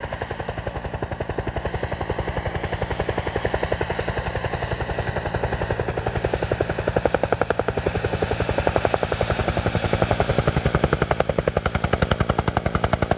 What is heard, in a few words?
Heli Bell 212 Hovers